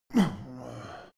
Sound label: male hit soft; menschen; schreie